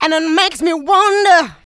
mixed vocals; Houseworx

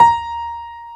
AIR PIANO B4

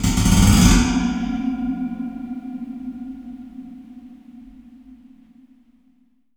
RACK GLISS; PERCMETALIC

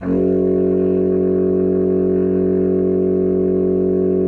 bassoon F#1; Xsample VOL-6; C BASSOON

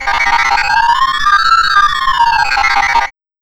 FX, Big Beat Drumloops; USB Soundscan vol.25; AKAI; NYC 125

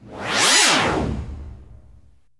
DANCE BEND; ILIO; Trance Fusion

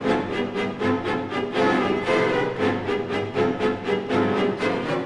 Orchestral Loops

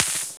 DR. LOOP, DRUMS; X-Static Goldmine 3

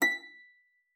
A#6, HARP PIANO; Xsample VOL-8

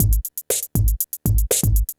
PHATT BEA

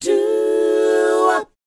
DOWOP B; ILIO; Vocal Planet

